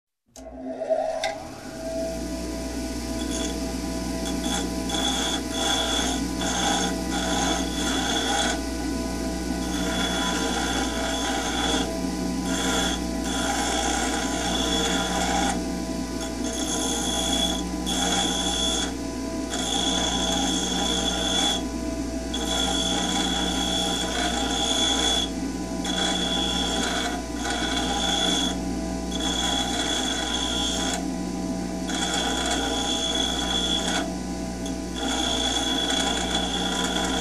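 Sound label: abrasive wheel grinding machine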